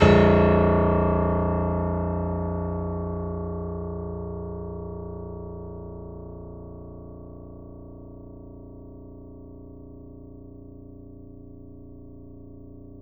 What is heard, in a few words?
Grand Piano A#-1 ff